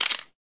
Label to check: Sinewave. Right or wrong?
Wrong